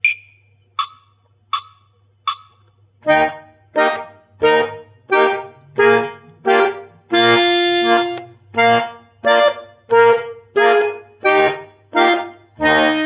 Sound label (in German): staccato legato portato Phrasierungsbogen